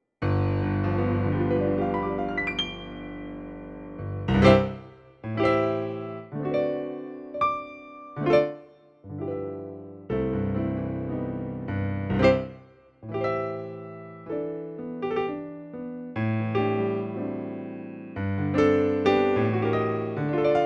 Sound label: Alto accompaniment